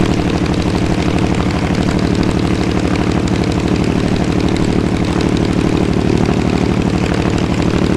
hellcat rear, engines; War Thunder

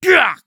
hit; Escape from Tarkov

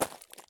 walk slate; Escape from Tarkov

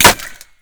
impact wood; Modern Warfare

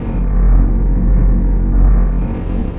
turbine loop

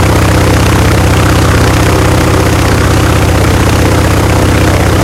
wildcat rear, engines; War Thunder

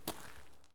walk concrete; Escape from Tarkov

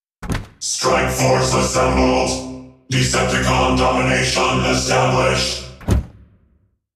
ambient port; World Of Warships; port3d

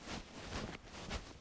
Move Sack; Civ4 warlords

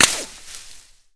impact foliage; Modern Warfare

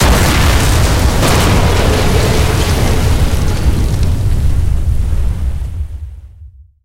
explosion naval; War Thunder

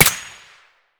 m1a fire silenced distant; Escape from Tarkov

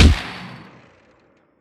YakB end; Weapons; DCS

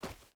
footstep hide dirt run; Rust

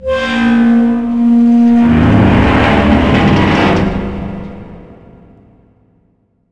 metal; Call of Duty Modern Warfare

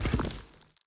pick stump; Rust

